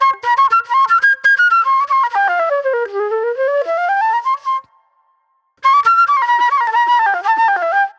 Fast Funk Flute; Sonic Foundry (Sony Creative Software); Manhattan Flutes; The Funky Mama

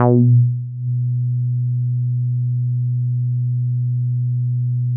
Synths BASS

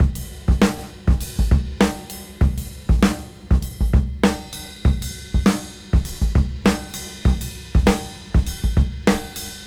Swing 98 BPM; AMG; Keith LeBlanc Phat Butt Jams

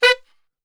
B 2 SAX SHOT

